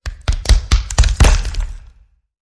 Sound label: Bones Breaking Hit